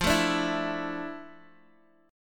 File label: A Suspended 4th F, chord